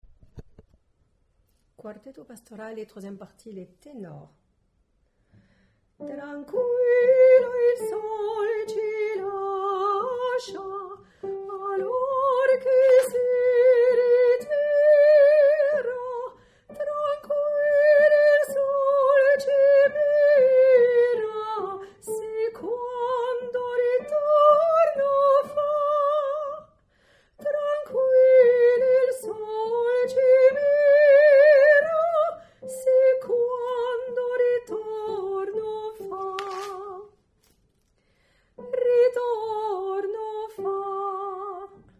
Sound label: Tenor; quartetto